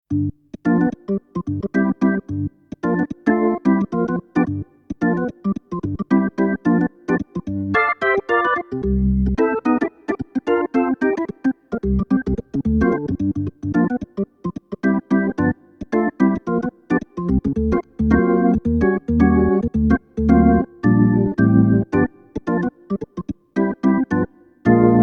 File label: Jazz Organ